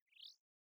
sting bird chirp; Rust

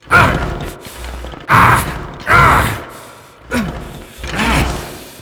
die loop

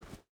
footstep rubber boots dirt jump start; Rust

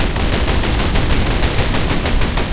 loop mk103; guns; tanks; War Thunder

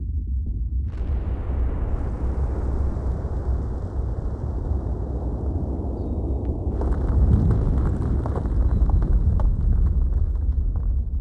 volcano; World of Tanks Blitz; ambient milbase

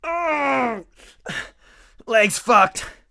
broke hand; Escape from Tarkov

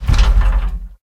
chasis hit; War Thunder

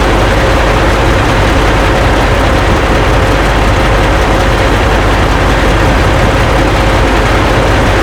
Stryker engine idle; DCS

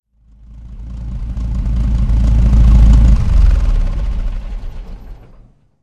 centurion engine stop, tanks; War Thunder

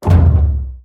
damage hits explosions, Warships

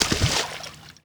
water run medium

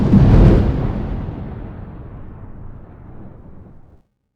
speeder explode far

